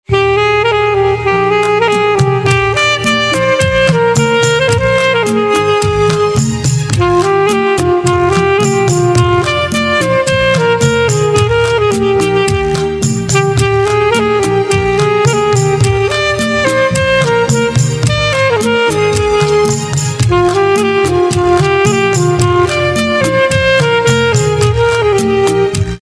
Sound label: Saxophone Instrumental Ringtone